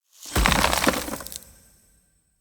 Fey Wild Snare Activate; Powers

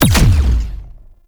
Alt Fire Punch, Weapons; Warframe; Corpus; Tenet Quanta

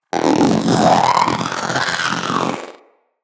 Companion Vocal Angry, Pets; Zanuka

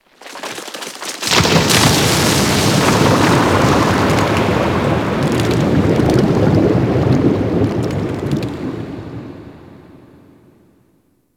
Ocean Cave Crack Large, Ambience; Warframe; Grineer Ocean

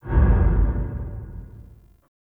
Low Mech; Warframe; Ambience; Nokko Colony

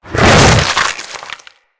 Infested Ancient Tank Single Tentacle Swing; Emissary Ancient Tank

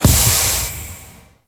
Moon Organ Air Blast; Ambience; Gameplay; Warframe; Orokin Moon

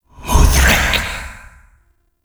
Grimoire Alt Fire Whispers; Warframe; Tenno

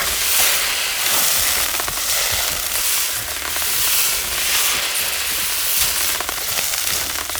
Sniper Lightning Strike Loop; Warframe; Sentient; Caliban Prime Sniper

